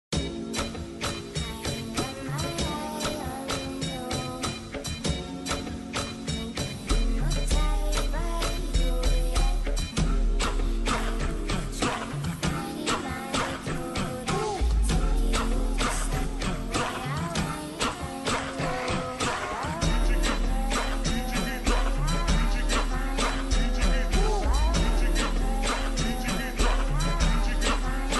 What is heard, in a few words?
slowed reverb